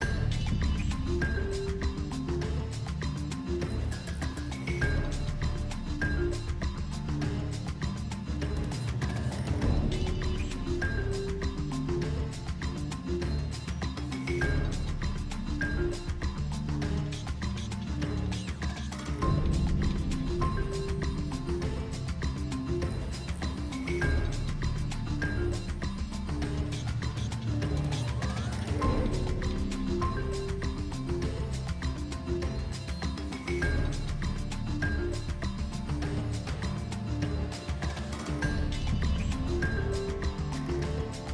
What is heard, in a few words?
Arcade Countdown; little big planet playstation vita